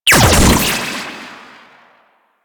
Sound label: Shock Rifle Primary Shot, weapons; Warframe; Corpus